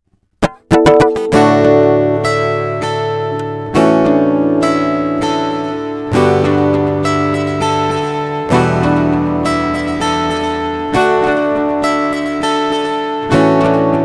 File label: with delay; edge delay